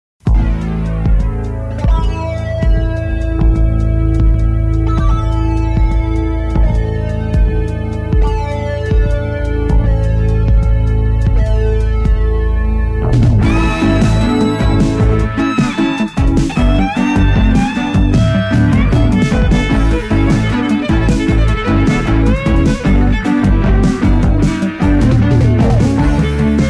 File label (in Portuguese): Trilha Violino; IpanemaFM; Spots Agencia Jinga